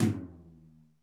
TOM TOM, Real

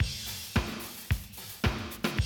drum loop, 100 BPM

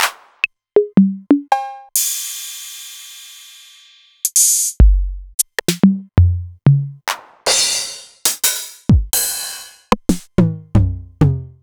Novation Drumstation; DrumstationV1

